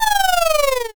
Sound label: horse neigh